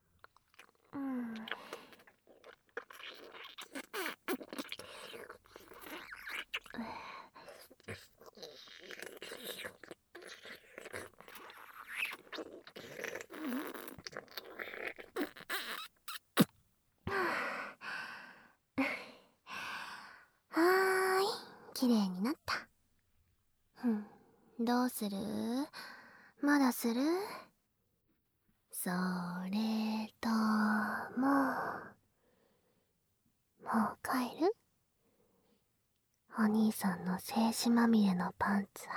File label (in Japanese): 裏オプ耳舐めセックス, ASMR